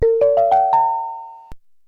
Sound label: Bell, Deurbellen